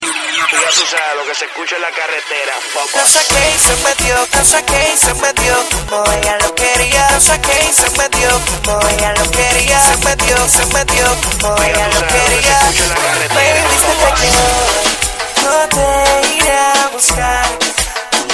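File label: Remix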